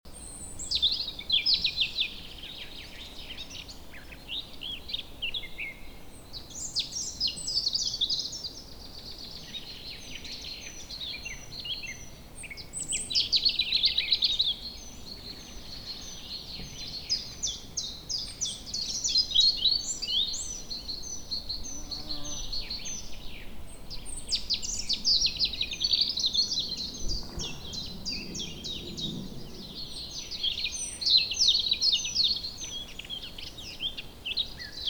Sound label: nature birds in woods loop